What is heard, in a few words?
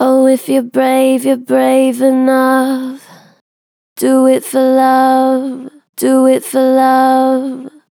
Gentle Vibrato "Oh if your brave", Vocals, Natural Vibrato Gentle; Harmor Vocals